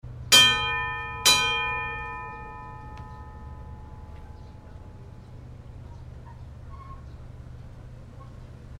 西洋の鐘の音

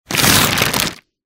巻物 モノラル

